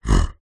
animals bear grunt

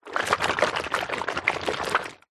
household hot water bottle shake water movements; zapsplat

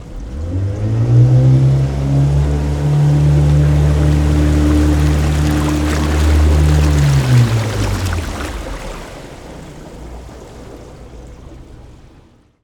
Pontoon Boat Drive; Blastwave FX

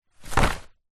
wetsuit top drop ground

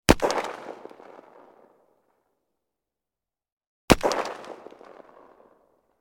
gun arisaka; still north media

